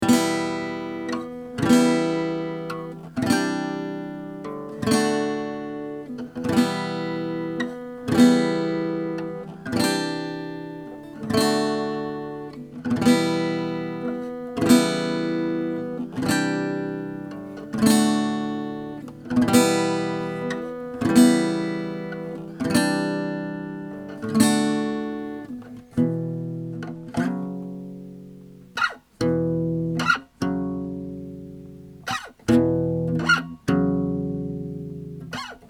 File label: guitar